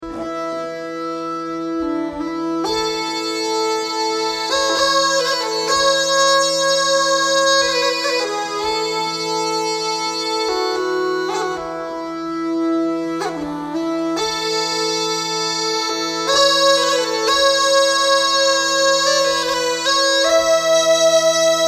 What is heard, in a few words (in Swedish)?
Marsch; musikexempel som jag spelat på bröllop, dop och begravningar